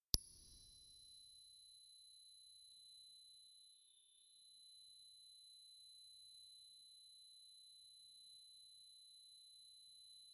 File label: Noise Tuning